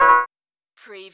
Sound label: Servo Panel Sound - Transit